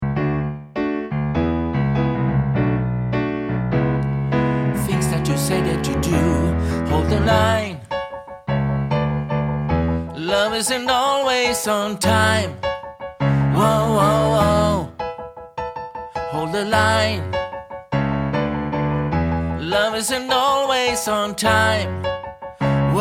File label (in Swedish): bas